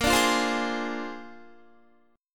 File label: Bb 9th Bb down Guitar 4F,4A,5C,5G,5C,6E; strummed